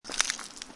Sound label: cookie crack; fortune cookie